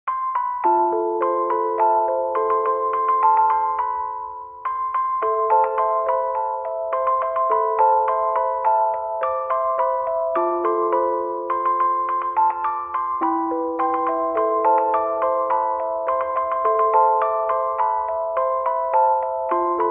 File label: Moonlight Music Box